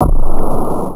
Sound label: teleport; whiteface